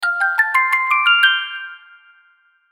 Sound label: Logo Xylophone Reverb; Ascend; Sonic Logo; Blastwave